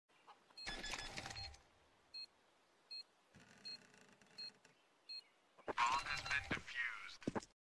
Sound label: defused tap